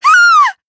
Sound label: squeee; toko; drae